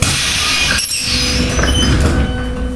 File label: opening doors, tram